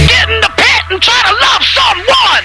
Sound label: kid